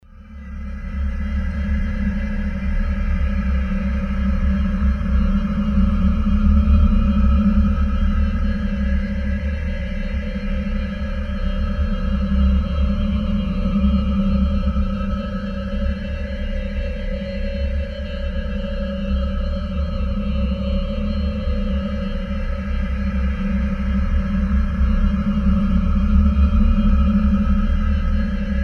alien sirens

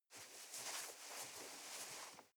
nylon leather very soft

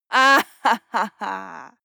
female laugh mocking

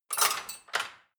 dishwasher cutlery place

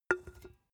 empty can put down hard

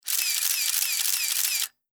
35mm camera shots

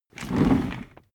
office chair worn trundle fast

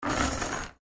chains dropping

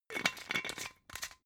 glass bottle massive close scratch

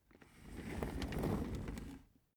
office chair trundle slow

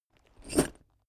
backpack metal single

